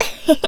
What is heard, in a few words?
laugh; Eternal Return; Tia; ENG